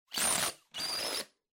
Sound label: Blowout Double